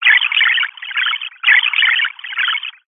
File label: science fiction radio receiver transmission interference burst scrambled